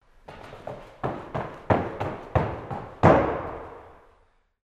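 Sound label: gymnastics run-up back somersault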